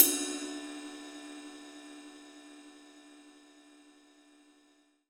long crash single hit d key, drum crash tuned to the D note; Cymbal; drum sample; one shot